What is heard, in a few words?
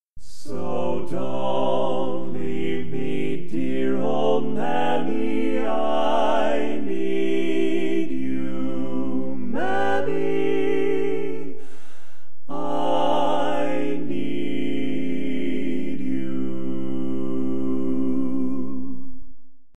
Lead, Classic Tags